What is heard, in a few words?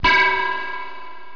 scifi